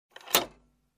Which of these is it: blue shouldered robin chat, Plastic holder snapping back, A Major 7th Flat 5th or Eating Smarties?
Plastic holder snapping back